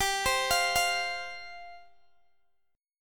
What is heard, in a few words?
G 7th Suspended 4th G, Guitar Open G; down